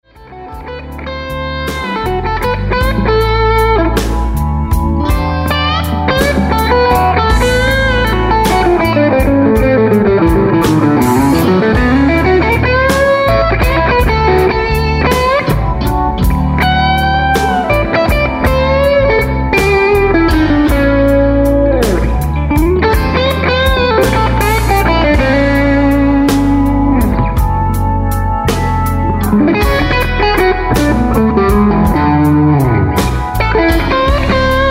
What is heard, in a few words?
ACid Blues